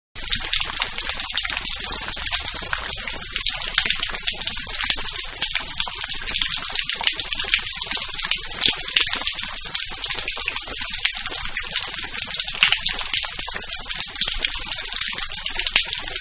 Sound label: Rain-Heavy-Puddling; Weather; Manhattan Production Music